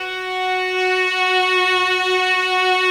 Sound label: VIOLA F#; 3D Sound - 3D Keys VOL-1